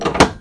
Lever; The Elder Scrolls III - Morrowind

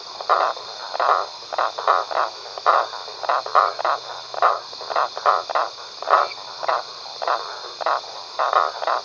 swamp; The Elder Scrolls III - Morrowind; Morrowind GOTY GOG

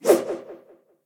slashes; SyrinScape; Temple of Urgathoa

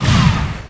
target, magic; The Elder Scrolls III - Morrowind; Morrowind GOTY GOG

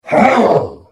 Hit; The Elder Scrolls III - Morrowind

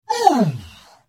Hit; The Elder Scrolls III - Morrowind

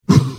Hit; The Elder Scrolls III - Morrowind

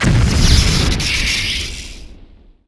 heart hit; The Elder Scrolls III - Morrowind

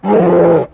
moan; The Elder Scrolls III - Morrowind; bear

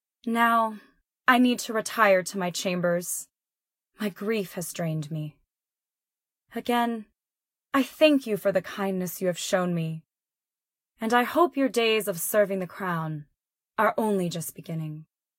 Audience End; Queen Illeosa; SyrinScape; City gone mad

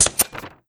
paper spit; Papers Please

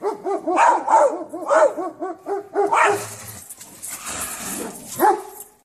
Riding Dogs

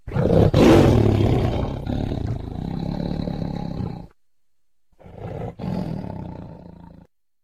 Tiger growl; SyrinScape; Waterdeep

